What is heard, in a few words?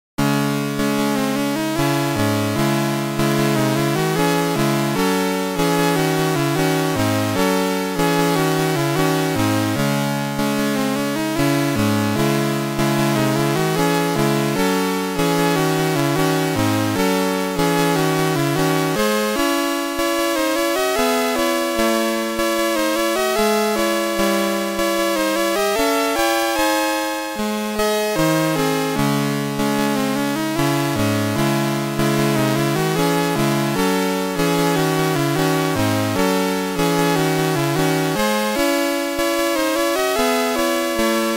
Combat